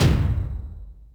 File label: Yamaha RM 50 Toms; Drum Samples